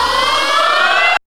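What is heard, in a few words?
synth effect; E-Lab; X Static Goldmine 1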